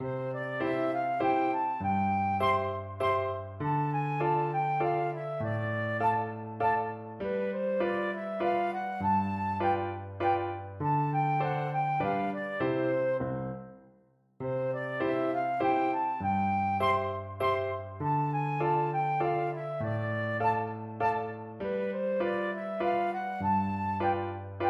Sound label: Waltz in A, flute